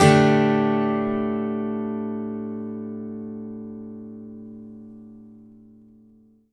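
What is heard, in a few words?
acoustic guitar D sus up, Whole Lotta Country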